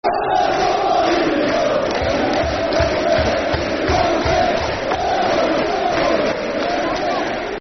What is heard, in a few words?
E I E I E I O [Football Chant (Soccer Songs)], Hartlepool; fanchants; Hartlepool United Hufc